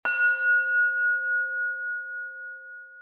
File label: tone; organic